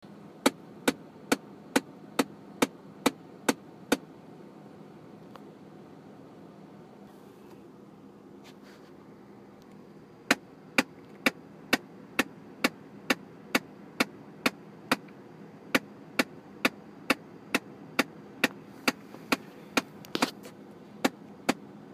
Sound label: climate control knocking